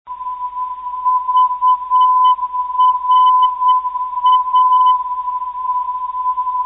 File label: vra 294khz, ndb